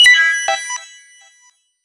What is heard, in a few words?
electric retrowave